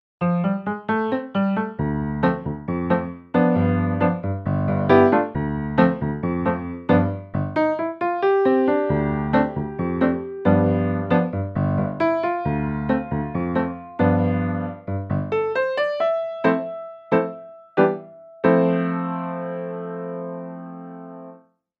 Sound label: Nord Piano 4 Black Upright